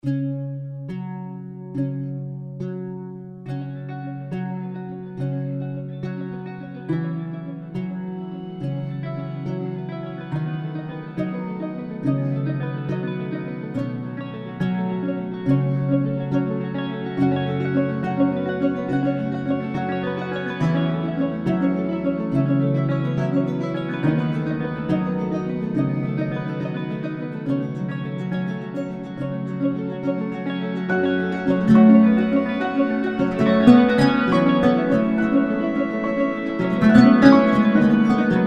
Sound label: lute